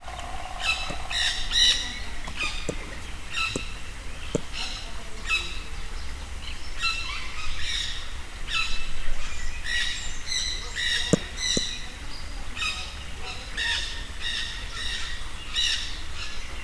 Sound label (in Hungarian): csupaszszemű kakadu is, gyűrűsfarkú maki; miskolci zoo 2009